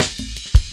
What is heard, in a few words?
Jazz Ride A Cut, Drum Loops, 165.5; Jungle; Zero-G Jungle Warfare 1, 2 & 3; 90 s Sample CDs